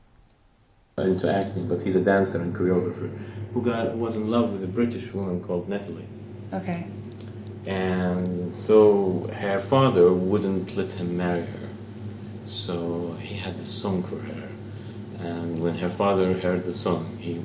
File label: jordanian folk song